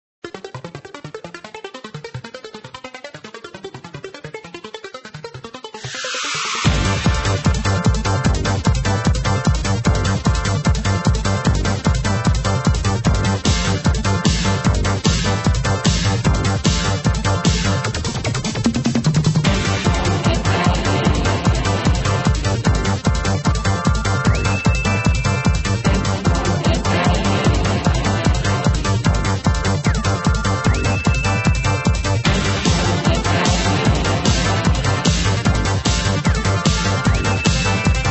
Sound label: Techno Power Mix; Sonic the Hedgehog - Remix